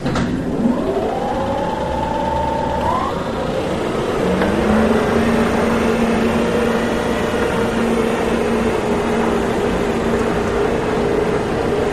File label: Hydraulic Lift Whin, hydraulic